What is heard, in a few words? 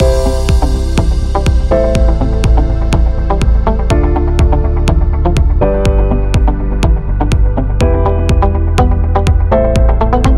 Corporate Loop Upbeat